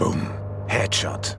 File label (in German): Boom